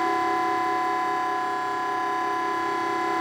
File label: forklift; Soldier Of Fortune II Double Helix; XBOX; ambience